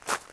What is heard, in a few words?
gravel; The Sum of All Fears